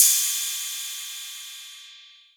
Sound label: 808 Cymbal; Novation Drumstation